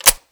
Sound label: revolver cylinder close